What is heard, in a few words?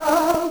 Voice Choir; Genesis